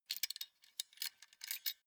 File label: stapler refill staples